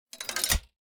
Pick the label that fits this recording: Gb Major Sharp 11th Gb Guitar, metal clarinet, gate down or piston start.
gate down